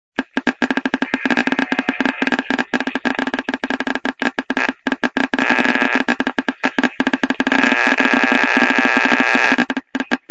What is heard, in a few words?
noise; siltronix